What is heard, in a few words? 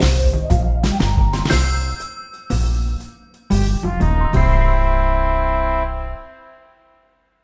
Fanfare Completed